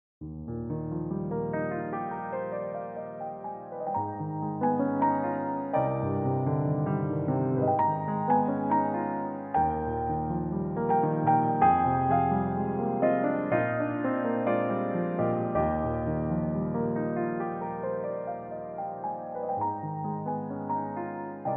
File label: Prelude Eb maj